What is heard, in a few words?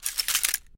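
scattered assault rifle cartridges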